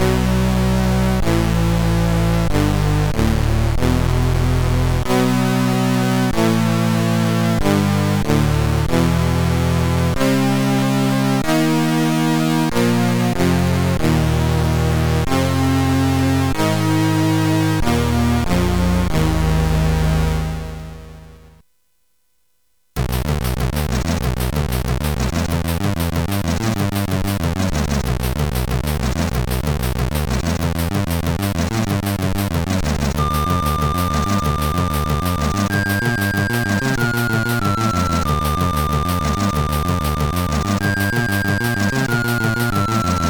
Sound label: Tech Music, sid CSG8580R5; Warrior